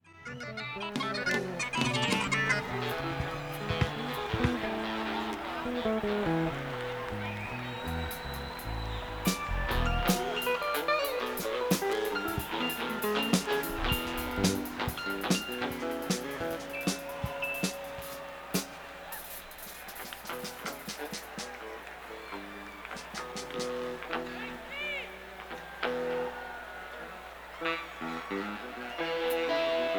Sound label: Tuning